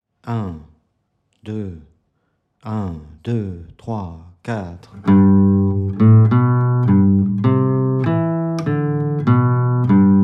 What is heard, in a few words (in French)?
exercice 100bpm exercice a